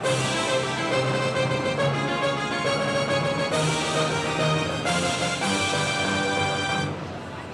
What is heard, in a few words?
An unused arrangement; SMG; World Clear